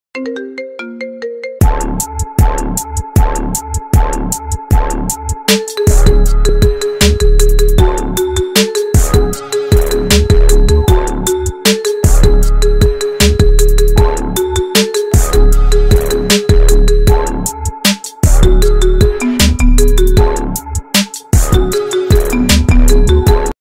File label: Iphone Marimba BGM ringtone